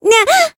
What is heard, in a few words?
Voice damaged